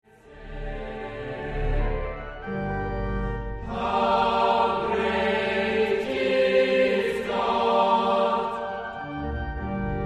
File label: Save me O God extract with organ